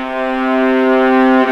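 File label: viola arco C3; East West; The Ultimate String Collection; VLAS ARCO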